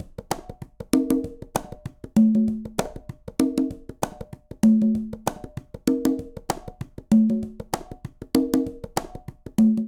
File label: Pop Conga Tumba 97bpm; Latin Percussion; Ableton; Factory Packs